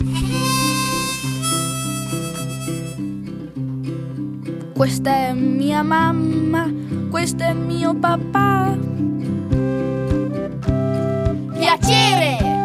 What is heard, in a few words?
song italian